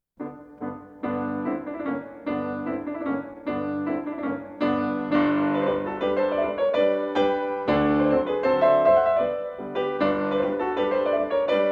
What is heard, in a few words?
Mazurka No.42 in G major, piano